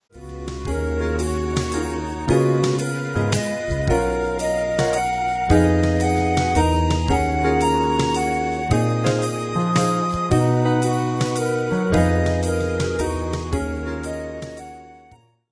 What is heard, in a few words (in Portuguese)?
triade menor